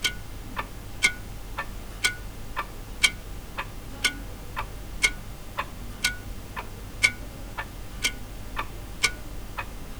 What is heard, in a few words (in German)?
Wanduhr; DR-100; FetHead